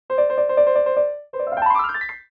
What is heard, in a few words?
Cartoon run away